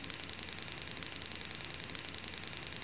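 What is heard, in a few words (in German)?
Telefon wahl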